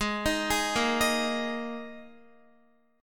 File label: G sharp Suspended 2nd, Guitar; arpeggiated; x,11,13,13,10,10; 4E,4A,5D,5G,5C,6F; G#sus2